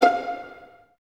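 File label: violins F5 pizzicato, String Ensembles; Miroslav Vitous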